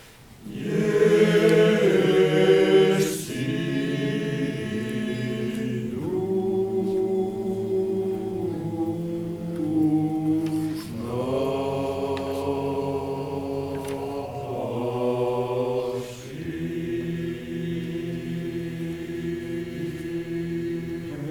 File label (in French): Ténors et Basses, Chorale; Les Vêpres